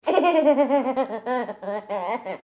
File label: baby laugh 18 months; a dump in the dark